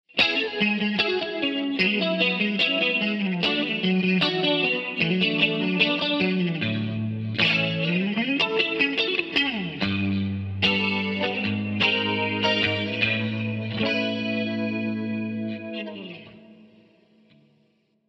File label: X-VIBE PHAZER; xgear